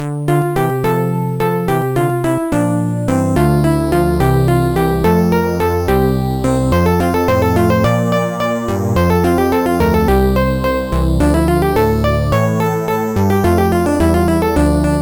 strings, mod, Trackers; Modland

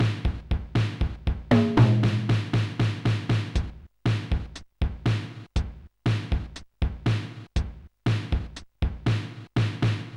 Kit Four, Sonic Drum Kit

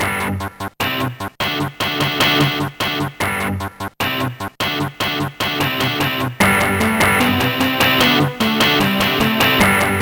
guitar solo; Trackers; Modland; Raptor

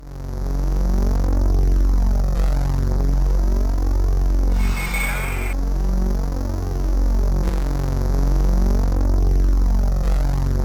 walking waves; JamCracker

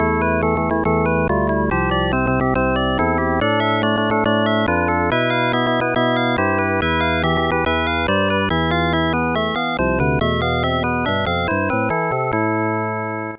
you won; The Music Studio

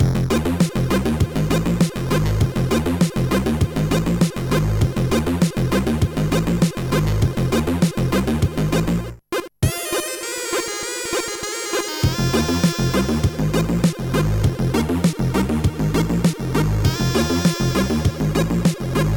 chiptune; Trackers; Modland

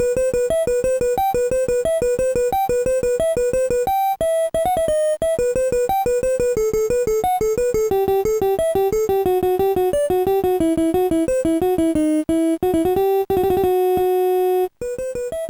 cuckoo; Deluxe Music Construction Set

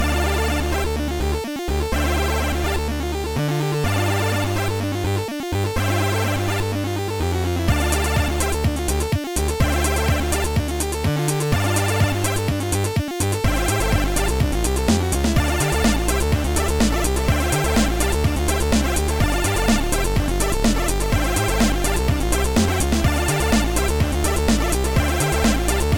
mod noise freaky styley, chiptune

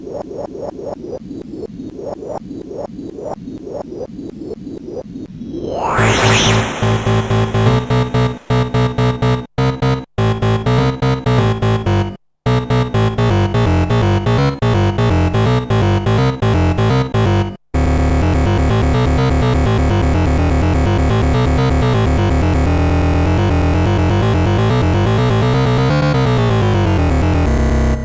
techno buzz; Trackers; Modland

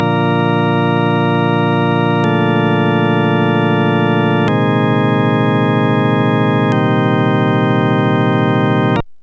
diss test; MusicLine

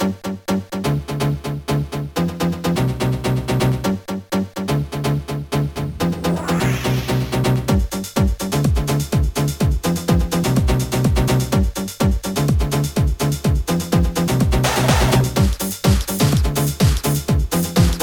funky melodyz; Trackers; Modland

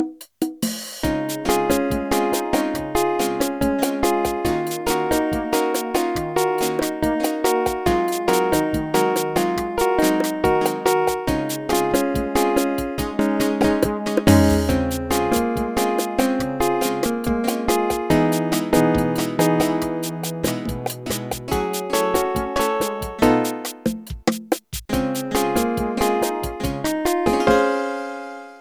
Bossa Nova; SuperJAM!

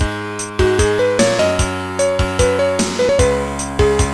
confuzed piano; Music-Assembler

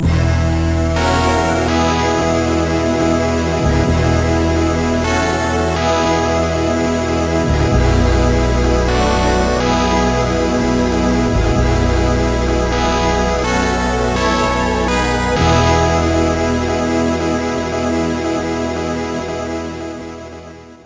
jingle

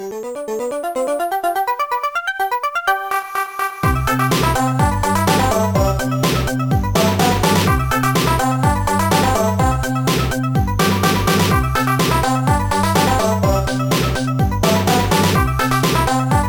happy rythm

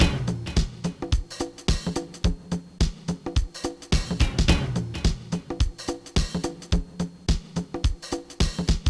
monster beat

